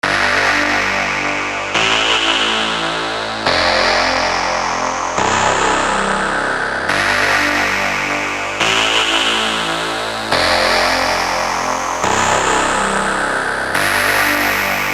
slow doodle

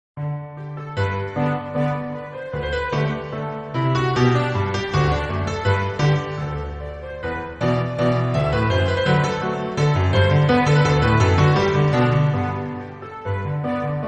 Orchestral Arrangement

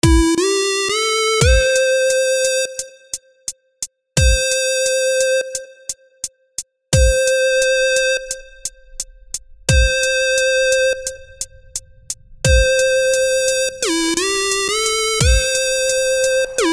Instrumetal

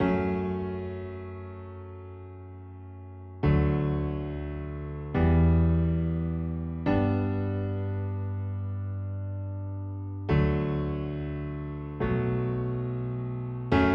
Intro Piano; 140BPM

